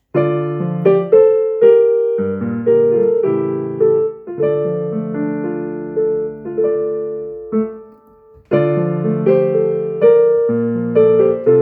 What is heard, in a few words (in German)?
halleluja klavier; chua nhat 3 mua vong